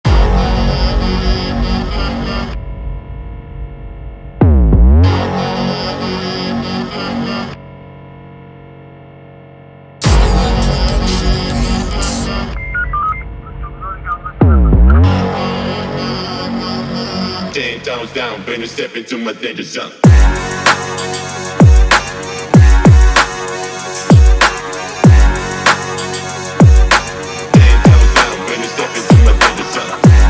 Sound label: Turkish Tulum Trap